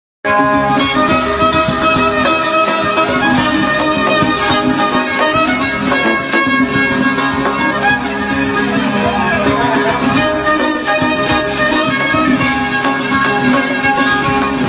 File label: fiddle